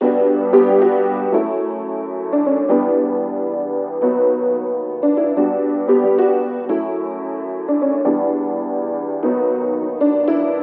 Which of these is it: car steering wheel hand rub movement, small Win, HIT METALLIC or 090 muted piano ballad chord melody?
090 muted piano ballad chord melody